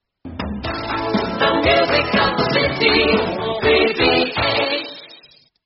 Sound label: jingle; australian radio 3ba